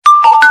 sms

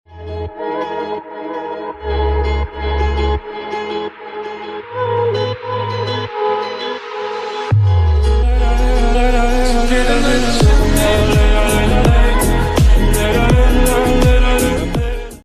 English Ringtone

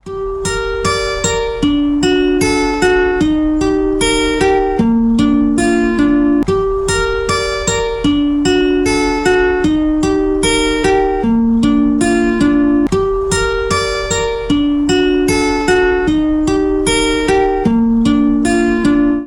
Guitar Ringtone

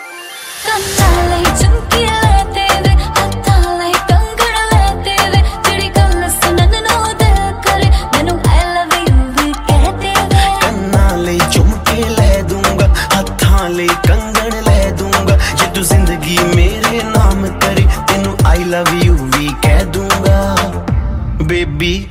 Latest Punjabi New Ringtone

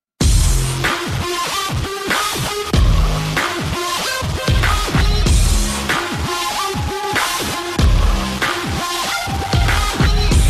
Android Attitude Ringtone

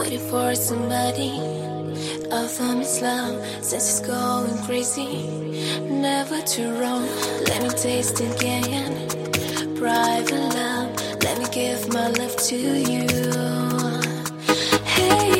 English Song Ringtone

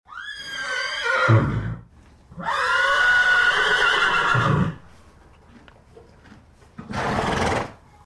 Horse Racing Bugle Call Ringtone

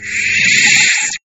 Message Ringtone; Sable

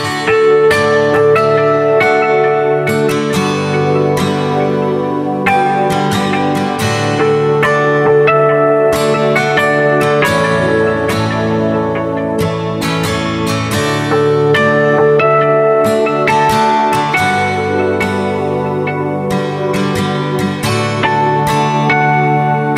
oppo ringtone

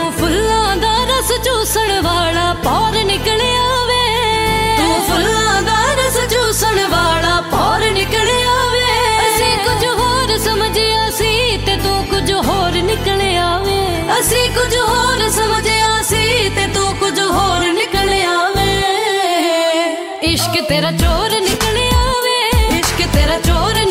sad punjabi song ringtone

